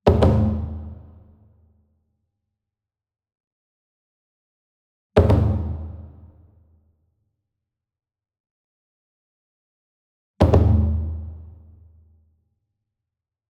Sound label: SINGLE DRUM Kodo Double Soft Beater Medium, Cinematic Strikes; boom library; Cinematic Strikes Construction Kit